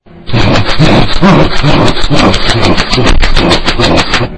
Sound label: earrape